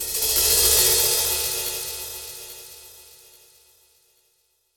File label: Pop Ride Phrase, Cymbals; Roland BK-5